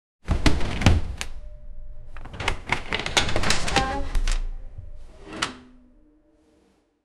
inter dimensional portal

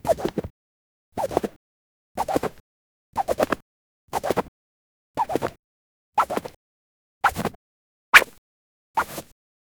scrabbling sounds of snea; swoop

